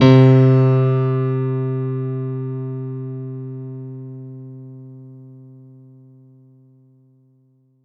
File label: C2 DANCE, ANALOG SEQ, Roland JV 2080